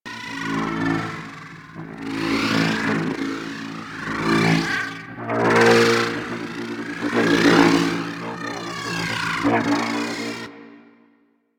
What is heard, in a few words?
Gas City Unstable Generator Loop; Ambience; Props; Warframe; Corpus Gas City; Props Remaster